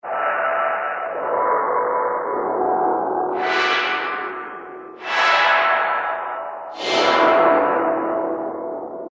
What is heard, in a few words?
Metal Vapor, E-mu Proteus-1; Presets